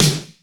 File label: FM SD, DRUMS; MOD.DRUMS; East West; Global Sounds